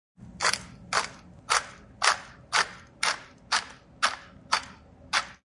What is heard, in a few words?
Pepper Grinder, Grinder pepper with a hand held grinder; Kitchen; Household